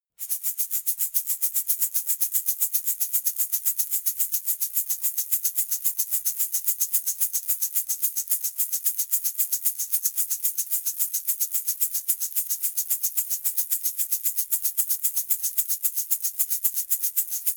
shaker plastic egg rattle slow hard

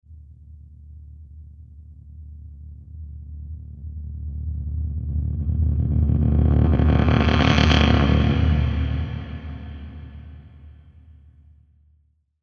dark scrapish riser

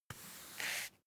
broom hand sweep dustpan slow

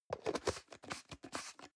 plastic can small lid screw close medium

